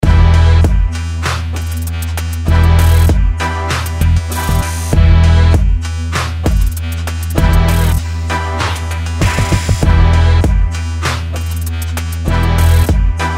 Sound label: Street Beat